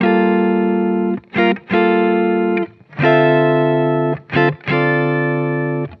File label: Electric Chord Loop, 160 BPM D Min; Cymatics